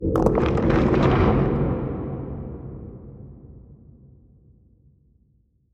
barren cold; ambient; Stellaris

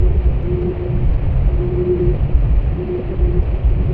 ringworld hum idle; ambient; Stellaris

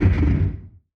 distance shield hit, weapons; Stellaris

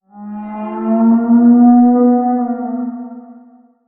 elder tiyanki idle vocal; Stellaris; distant stars

